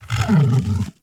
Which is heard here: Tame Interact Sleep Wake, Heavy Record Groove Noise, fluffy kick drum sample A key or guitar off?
Tame Interact Sleep Wake